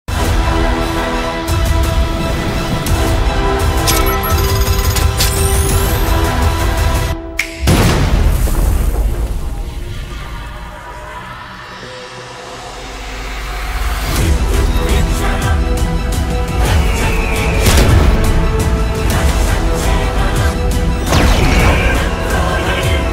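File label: attitude background music; eagle movie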